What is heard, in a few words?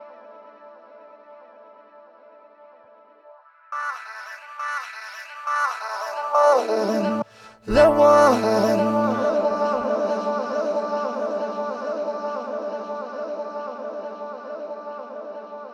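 vocals loop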